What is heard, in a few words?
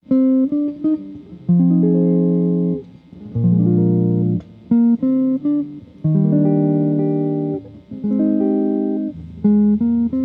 Jazz Band; Band In A Box